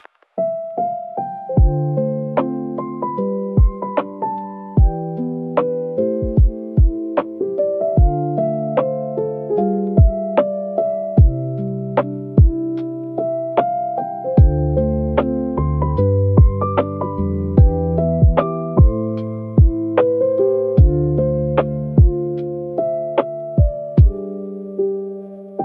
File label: Rain on Old Keys, lofi piano for recall and nostalgia